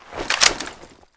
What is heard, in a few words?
mag in, weapons; brenmk3